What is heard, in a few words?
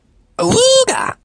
awooga